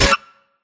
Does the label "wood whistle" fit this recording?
No